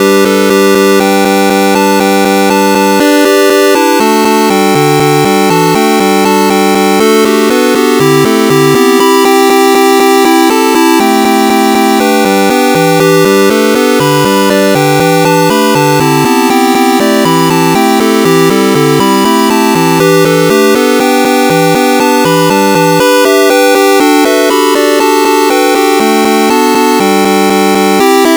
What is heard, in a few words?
square major pentatonic; Chiptune Major Pentatonic; Major Pentatonic Scale; music generation library in Rust